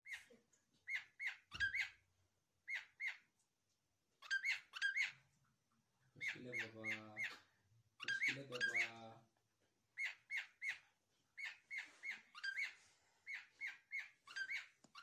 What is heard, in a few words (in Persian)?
صدای عروس هلندی بدو بیا; آموزش حرف زدن و تحریک جف